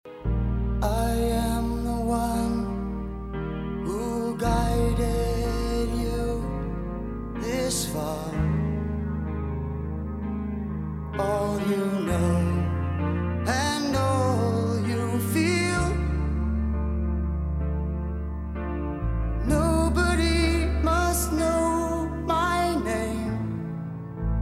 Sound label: guide vocal